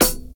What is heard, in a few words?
sizzly snare single hit D# key; snare drum samples